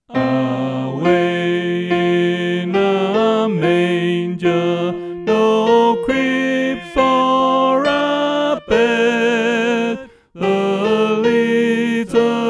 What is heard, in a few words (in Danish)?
Sopran; oevefiler